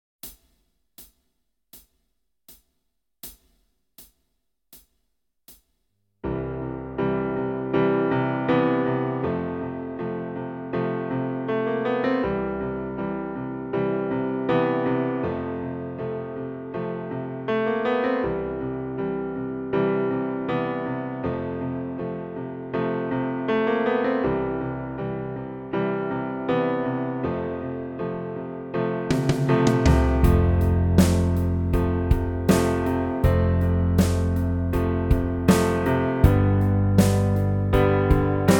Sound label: Backing track, keyboard; Kenneth Baker - The complete keyboard player